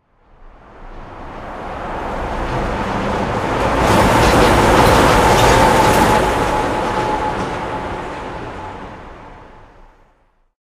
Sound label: train 1shot, harbor; Source Engine; tf; cp harbor